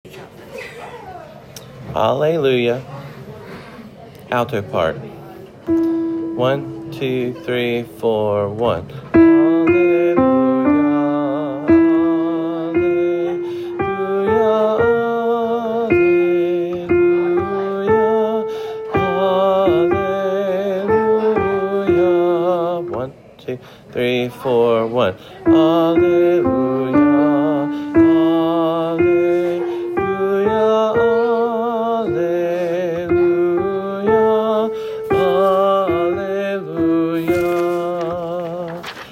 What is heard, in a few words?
Alleluia has alto part mixed